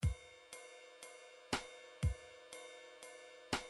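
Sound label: midi drums dixie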